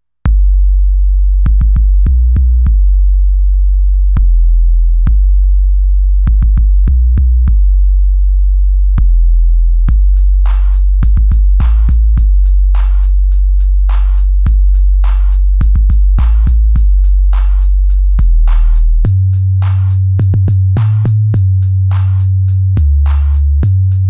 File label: Bass bounce